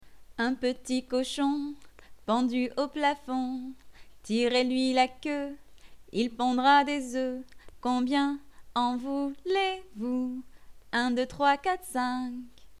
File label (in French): Un petit cochon chant